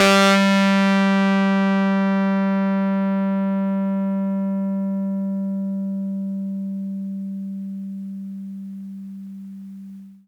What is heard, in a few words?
RHODES FUZZ, FX; EP FX; PlatinumPhattCD2; E-MU Producer Series Vol. 8 – Platinum Phatt